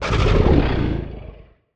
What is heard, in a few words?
Feline Breath, Monster Sounds; The Decline Of Warriors; Monster Sounds Volume II